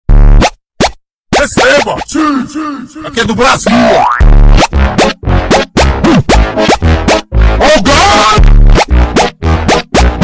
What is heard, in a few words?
funky slowed